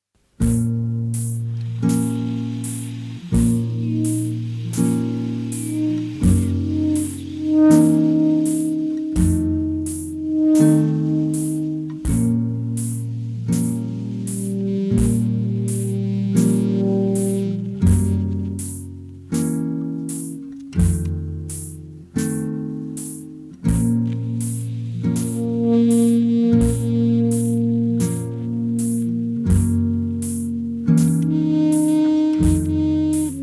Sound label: sax